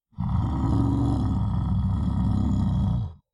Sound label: kagouti warning; skywind